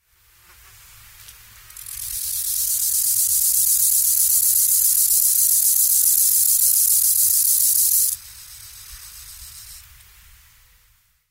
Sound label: rufous grasshopper